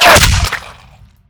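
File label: physical bullet impact flesh, violence; Benny Placeholders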